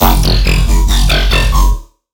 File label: Riddim Bass Hit F#; One-Shots; Bass Hits; Squirm; Ghosthack; Riddim Essentials Volume 2